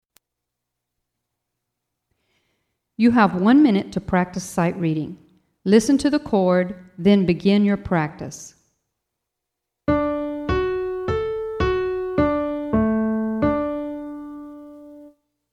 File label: Alto D Major